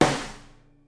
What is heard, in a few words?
SNARE, Live Snares